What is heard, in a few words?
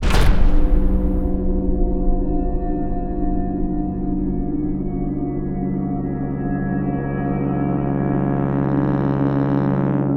STING Slaughtered With Melting Copper, Cinematic Metal Impacts